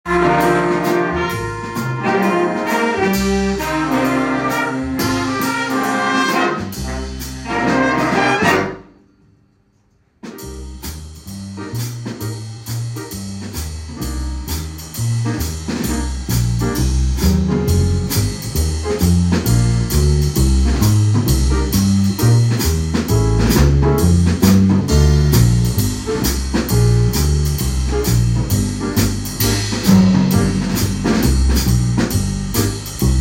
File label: backing track